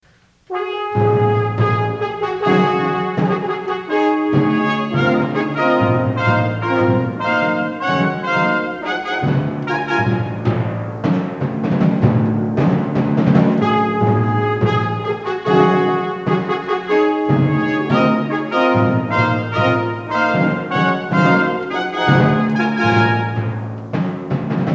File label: Suite for Brass and Percussion; Movement 3; Joyful; chamber